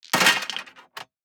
DICE on Metal, Throw and Roll, Standard, 6 Six Dice; Samples; ArticulatedSounds; DICE Sfx Party (Extended)